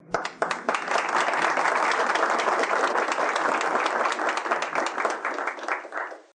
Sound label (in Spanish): Aplauso